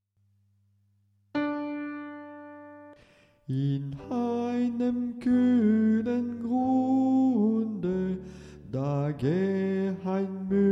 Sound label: soprano